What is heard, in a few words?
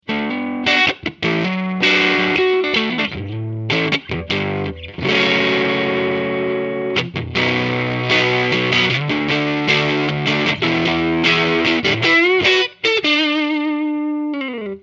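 overdriven, guitar amp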